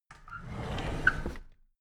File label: drawer big squeak open regular